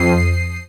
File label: dance chord